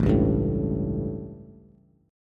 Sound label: A 7th Bass, chord; strummed; JGuitar